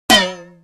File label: cartoon drum; TECKNAT